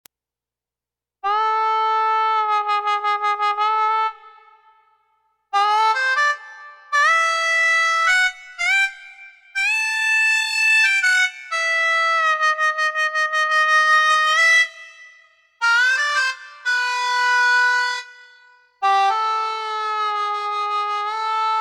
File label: SY99 harmonica